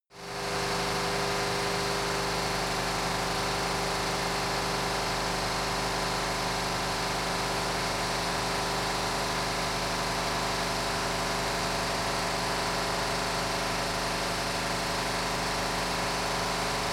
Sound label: car camaro high rpm engine